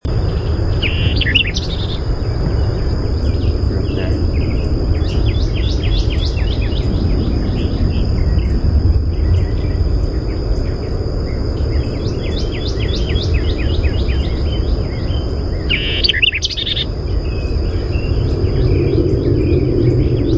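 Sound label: White-eyed Vireo song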